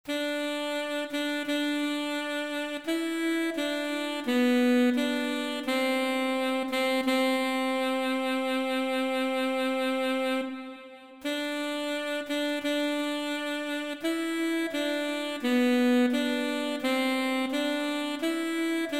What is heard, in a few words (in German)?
Slow Fox Alt Sax